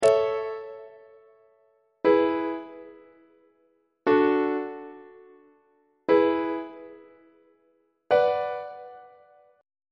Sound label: a major deceptive cadence on treble clef